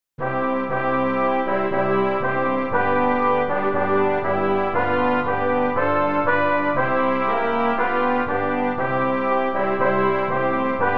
Hymn BRASS

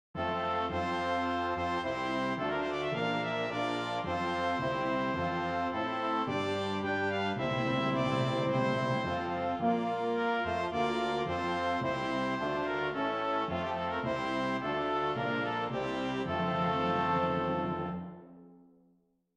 Brass; Hymn

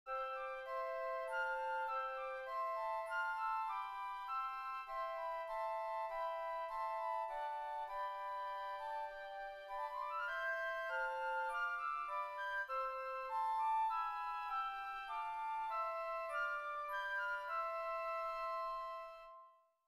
Hymn Flutes

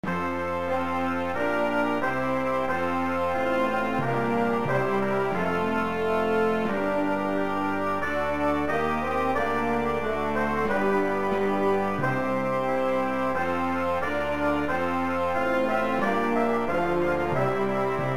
Hymn Brass

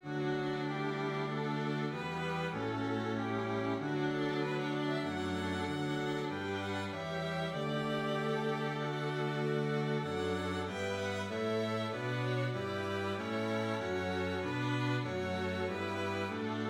Hymn Strings

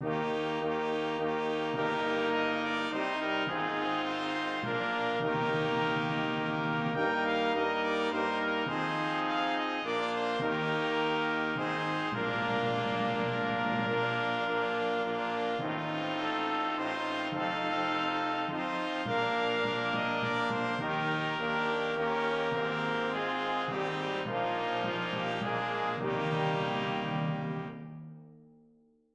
Airforce Brass

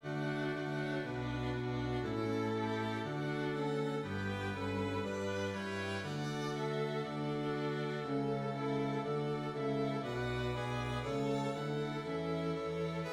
Hymn Strings

